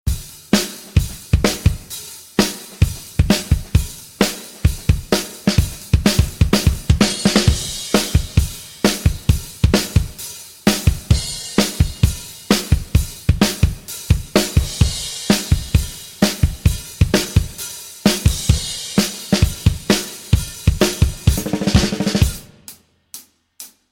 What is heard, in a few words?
Drums Plate Verb